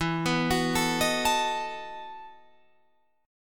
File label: E Major 7th Suspended 2nd Suspended 4th, Guitar, Open F; EM7sus2sus4 arpeggiated